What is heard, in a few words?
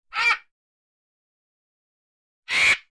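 vulture cry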